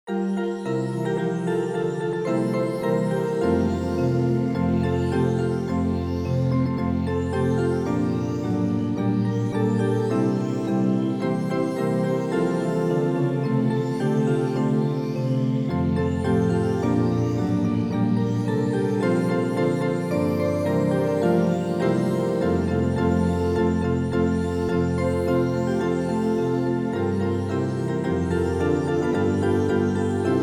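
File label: jingle bell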